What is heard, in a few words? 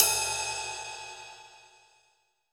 Pop Ride, Cymbals; Roland BK-5